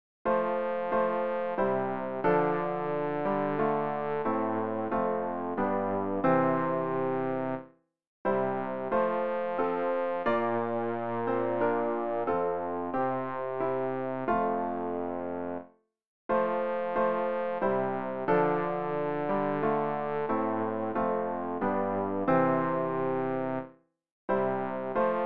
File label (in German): bass, Übehilfen für das Erlernen von Liedern; ref gesangbuch; rg 276 such wer da will ein ander ziel